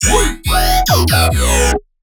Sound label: Melodic Bass E 140, One-Shots Bass Hits; Promise; Ghosthack; Color Bass Essentials